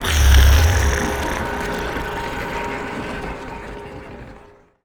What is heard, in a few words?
lithoid spider; lithoid portrait; Stellaris